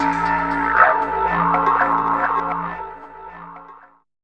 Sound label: DR. LOOP, TRANCE; X-Static Goldmine 3